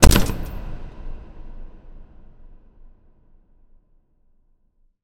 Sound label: impact hatch; Hits